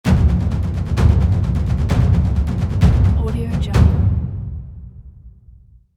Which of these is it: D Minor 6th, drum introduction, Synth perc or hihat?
drum introduction